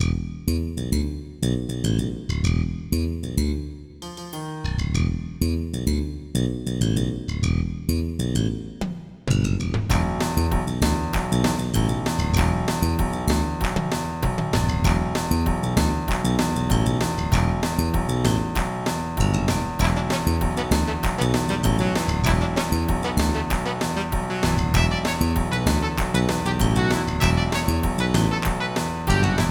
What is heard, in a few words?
Funk; tilerama